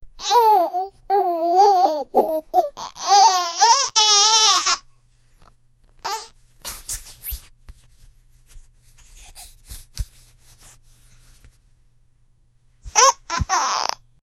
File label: a fat baby cartoon boy